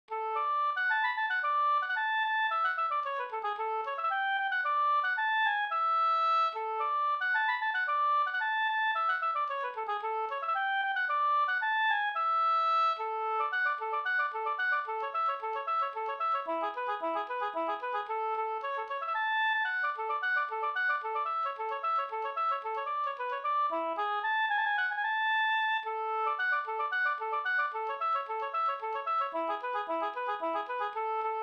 polska Bingsjopolska polska; Svedbergs polska e Pekkos Helmer

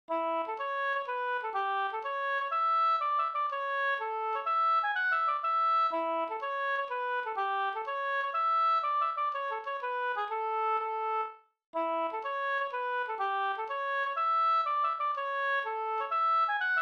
polska springdans; fr Beted Vr u Nils Stalberg

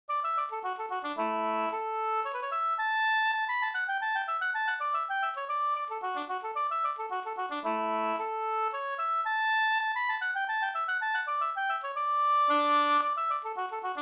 polska Storpolskan; Dal Jerk Rattvik

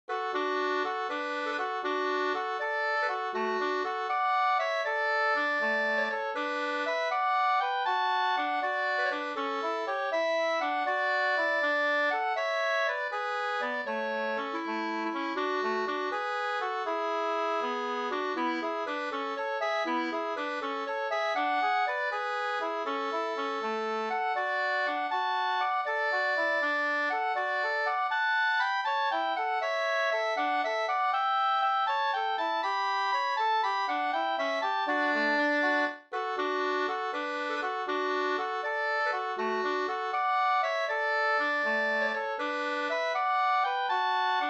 folkdans; Familie Sekstur 2st

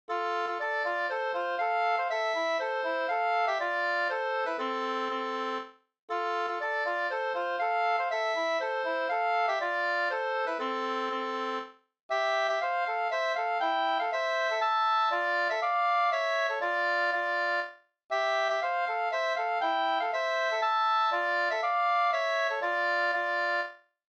polska fr Vastervik

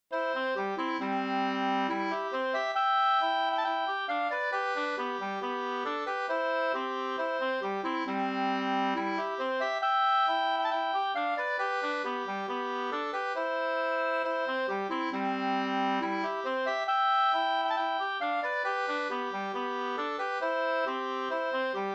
ganglat

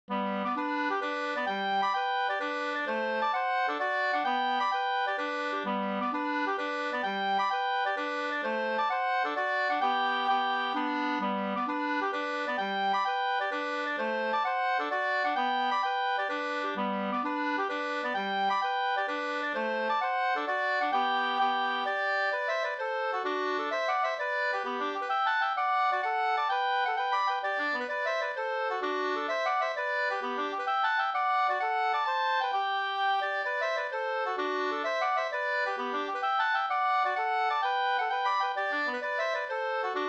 mazurka; Svalans middagssovel SvL Nk221 2st

